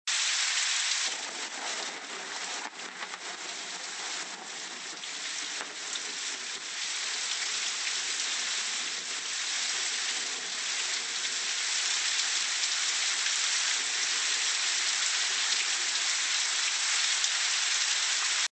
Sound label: thunder high pass